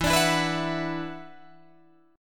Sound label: E 7th Suspended 2nd, Guitar, Drop D; chord shape